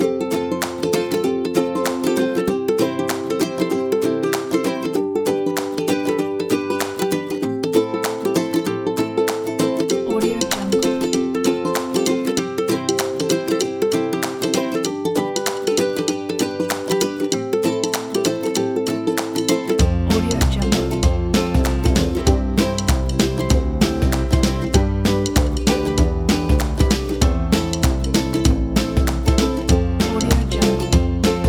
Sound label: acoustic funny